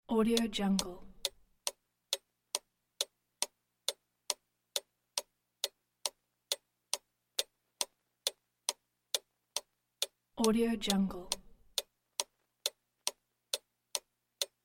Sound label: clock ticking